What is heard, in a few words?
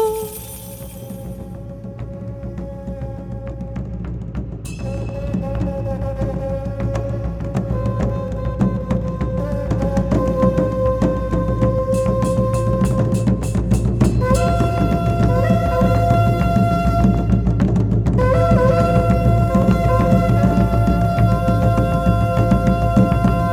Instrumental